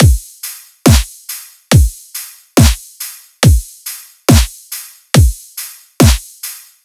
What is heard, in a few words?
Riddim Drum Loop Full (140); Drum Loops; Ghosthack; Riddim Essentials 2020